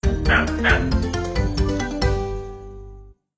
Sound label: Win